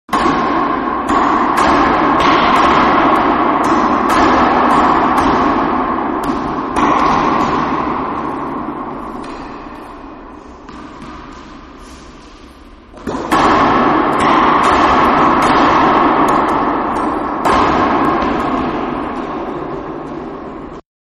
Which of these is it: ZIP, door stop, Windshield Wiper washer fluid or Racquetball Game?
Racquetball Game